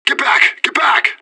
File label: blow